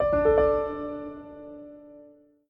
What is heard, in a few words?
Piano Notification